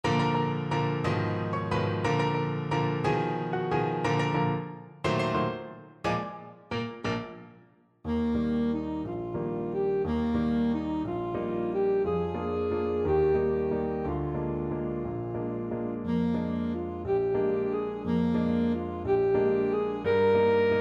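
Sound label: merry widow waltz alto saxophone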